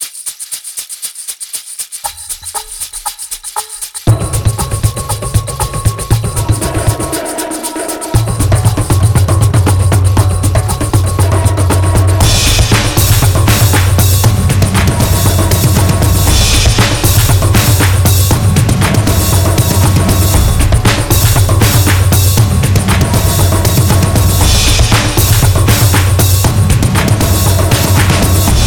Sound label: Action Drum Sequence